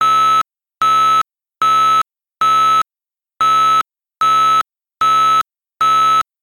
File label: drone, voice evacuation tones; voice evacuation tones and messages